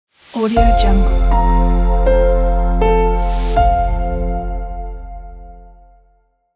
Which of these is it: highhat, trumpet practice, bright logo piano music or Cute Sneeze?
bright logo piano music